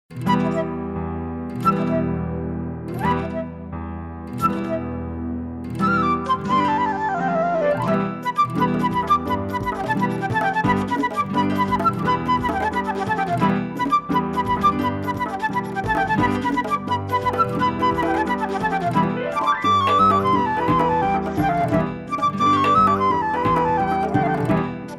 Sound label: Flute; Gilan Shad